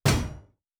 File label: Shield Metal; Fantasy Sounds Bundle; Medieval Combat Sounds